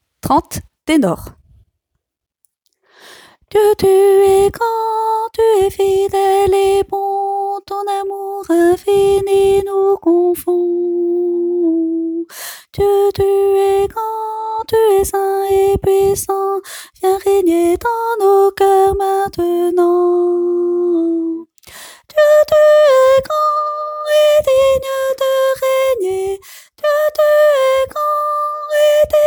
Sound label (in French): ténor